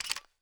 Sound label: m3s90 load